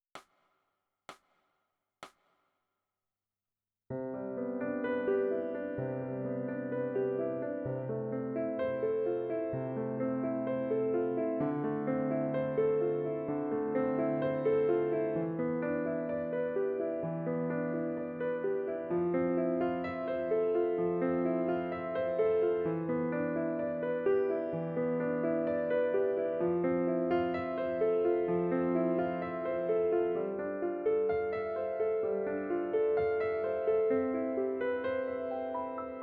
Piano

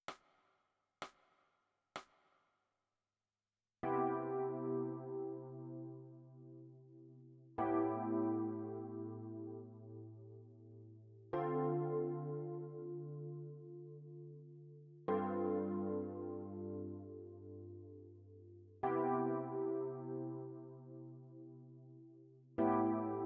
E Piano

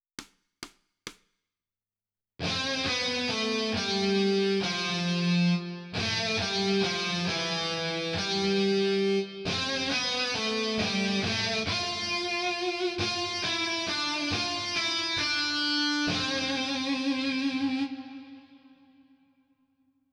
Melody

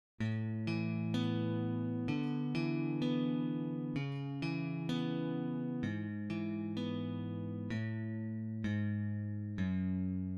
E-Guitar; Beethoven; プロの音プロの技・令和版

